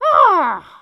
Aww; Ravio: "Aww..."

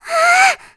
Happy Gasp; Aryll happy to see Link; Zelda

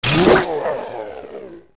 Stalfos Die; zelda; OOT

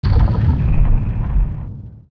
Platform Descend Stop; Jabu Jabu

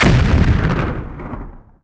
Bomb Blow; A bomb exploding

